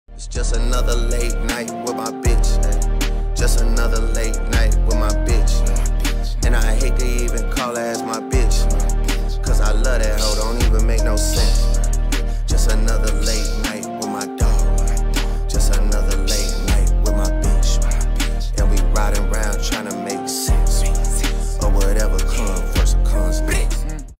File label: english ringtone